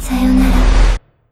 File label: soft hitclap; osu